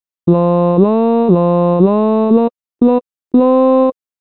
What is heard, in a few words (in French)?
basse; Zigeunerlieder 4